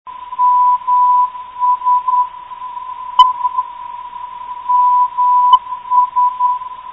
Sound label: 275khz, ndb